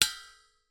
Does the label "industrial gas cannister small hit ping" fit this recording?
Yes